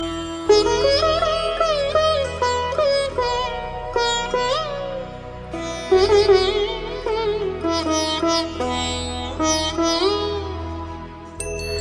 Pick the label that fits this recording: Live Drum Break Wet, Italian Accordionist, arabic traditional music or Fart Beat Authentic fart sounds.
arabic traditional music